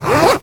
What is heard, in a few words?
zipper; foley; sound effects; sound effects one